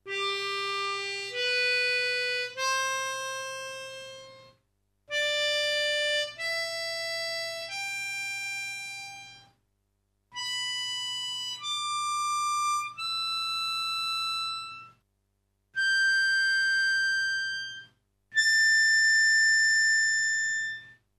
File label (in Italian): armonica frase